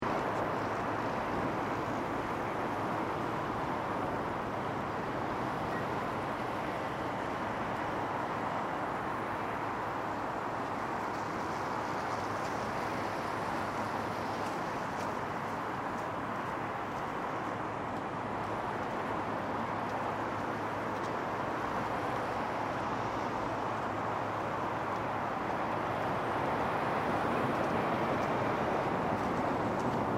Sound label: Train Pass Bridge Traffic, Train passes on top of bridge; Well defined train or subway sound. People walk by in calm enviroment with medium traffic in dis